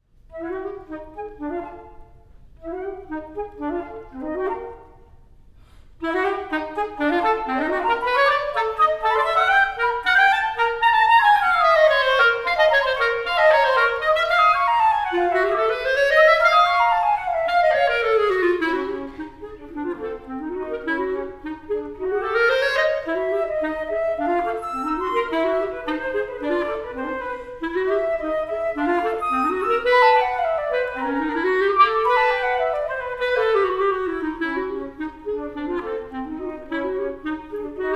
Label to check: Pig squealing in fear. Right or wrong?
Wrong